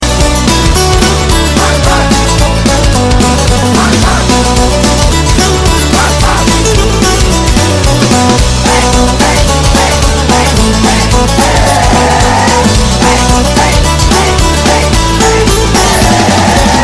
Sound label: Guitar Bass